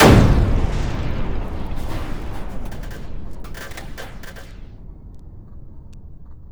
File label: EXPLOSE, Disjointed Textures; USB Soundscan vol.33; AKAI